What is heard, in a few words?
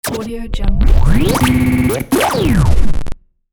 vinyl